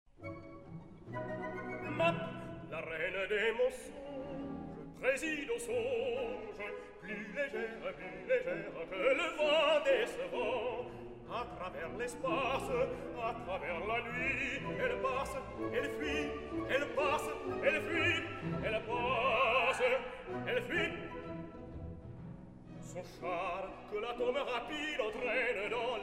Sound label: Baritone Excerpt; romeo and juliet